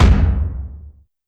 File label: Big Drum; YAMAHA RM1X